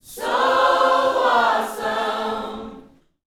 Vocal Gospel PHRASE

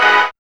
CHORD

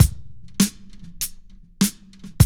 LOOP; E-Lab; X Static Goldmine 2

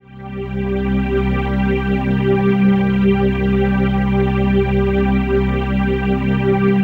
PHASE PAD, ATMO PADS; Best Service; XX Large

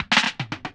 ROLLS; E-Lab; X Static Goldmine 2

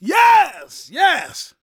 SHOUTS, Gospel, PREACHER; Spectrasonics; Vocal Planet Vol.1 Gospel

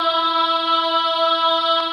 VOC

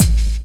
BD HHAT; E-Lab; X Static Goldmine 2; akai

